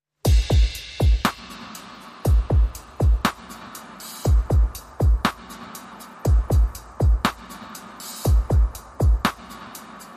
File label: Drums and Bass Mix